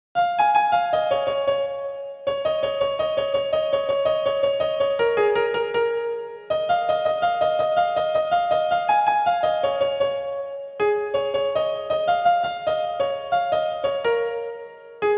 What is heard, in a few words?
instrumental ringtone